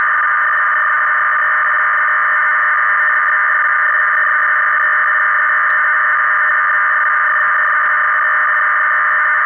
NORTH KOREAN DIPLO FSK 600 Bd FSK CONTINUOUS BITSTREAM FEC; North Korean 600Bd FSK in FEC mode sending crypto traffic